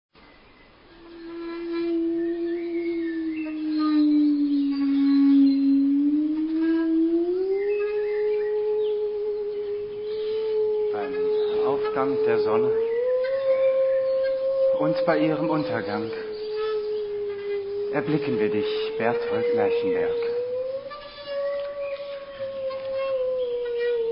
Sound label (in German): singende saege